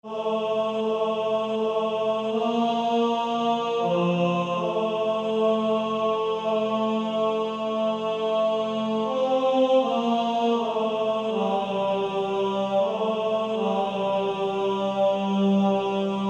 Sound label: Male Choir; 2nd Tenor